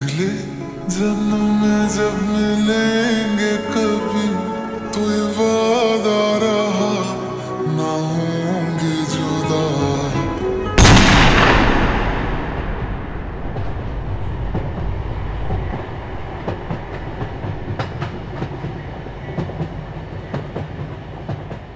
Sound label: Slow Reverb Version Ringtone